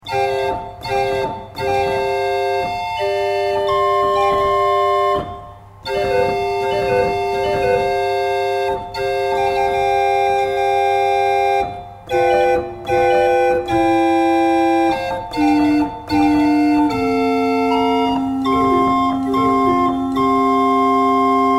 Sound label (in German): Rohrfloete 4